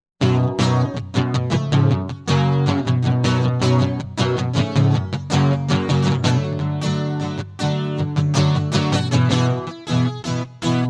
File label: acoustic; MegaEpix